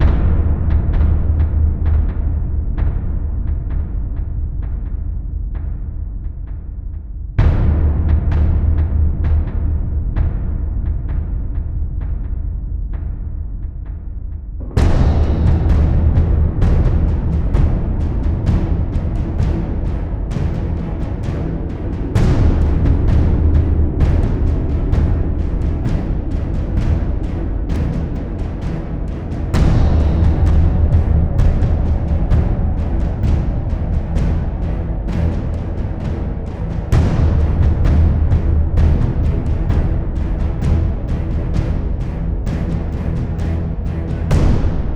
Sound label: Epic 65BPM, Cinematic Trailer Music; BlueFX; Epic Soundtracks